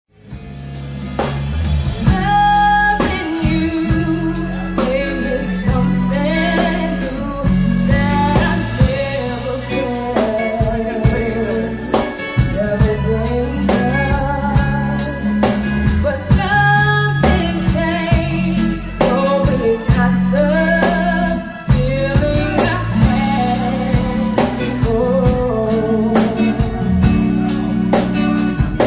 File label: LOVE BALLAD